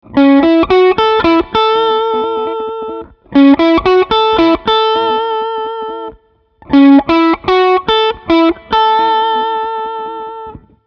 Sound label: Pentatonic Lick Major